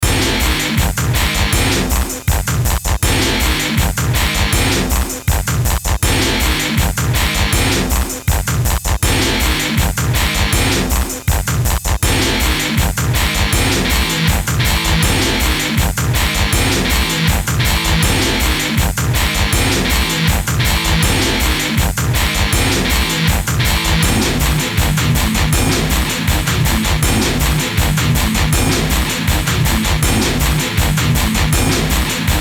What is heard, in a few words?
BEAT HARD NOIS, Beat Hard Noise Distortion Guitars; Miscellaneous Loops